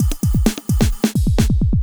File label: electro kit 130